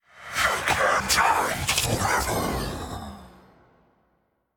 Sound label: Hiding Spot; The Town; Easter Egg